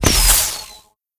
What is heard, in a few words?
Candy cane blocker Explode; Candy Crush Soda